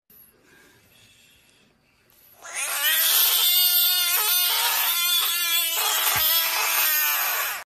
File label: frog go aaaaaaaaaa